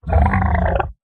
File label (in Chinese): 僵尸疣猪兽待机声